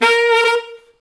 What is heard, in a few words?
SAX A. STAB, Alto Section; Roland L-CD702